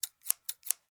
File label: Scissors Cut